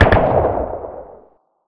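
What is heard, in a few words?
double barrel distant, shotgun; hl1 weapon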